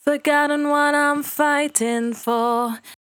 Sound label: 68bpm D, Velvet Vocal; Zero-G